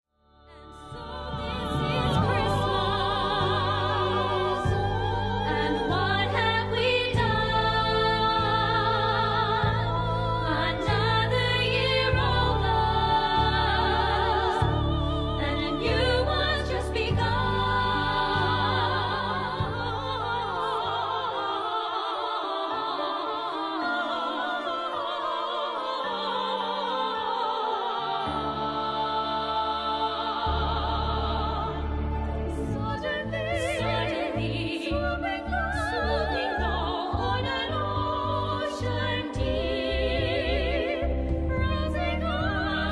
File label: classica christmas medley